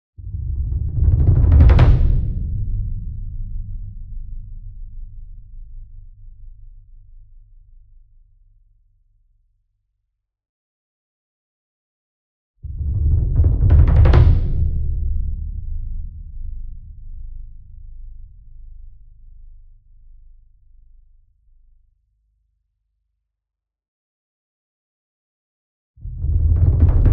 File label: SINGLE DRUM Bass Drum Concert 40 Inch Roll Soft Beater Near; boom library; Cinematic Strikes Construction Kit